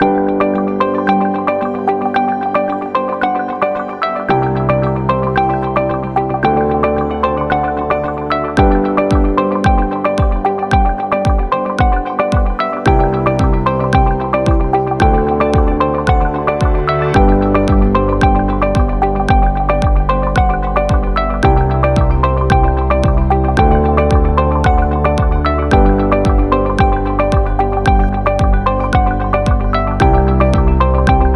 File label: In Corporate